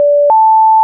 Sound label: alert; context-warn